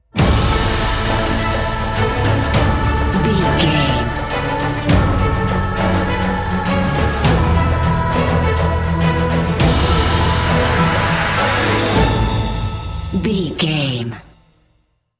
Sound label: cinematic corporate